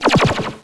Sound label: Speed Boost Sound